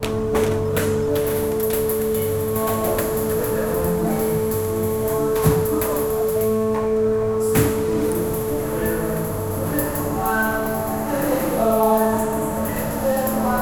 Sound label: sound in music creators, studio